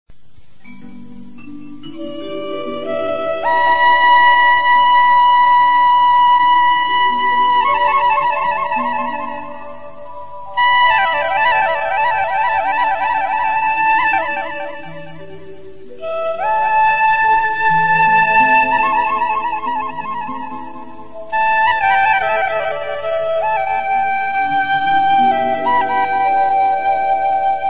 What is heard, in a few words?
Romantic Flute